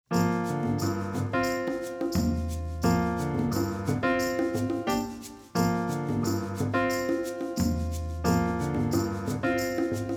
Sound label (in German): trommellied zum mitspielen u. singen; musikgarten